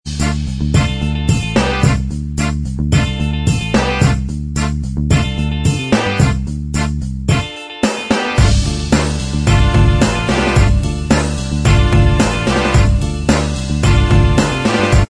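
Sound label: instrumental track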